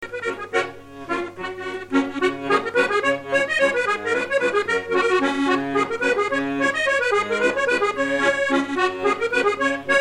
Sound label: bourrée; La Chaise Dieu 43